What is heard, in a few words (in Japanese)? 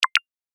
メッセージ